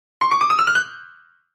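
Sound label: Grand Piano Fast Comedy; keyboards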